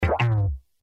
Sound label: grow